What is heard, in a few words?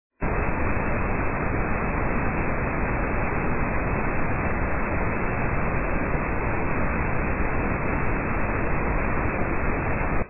Pink Noise File 6000 hz; Intensity 31 and 8; 10 Sec; 8-bit stereo